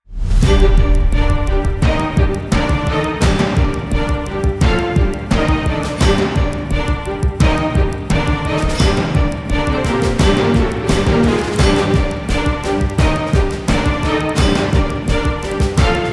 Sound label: Action Intense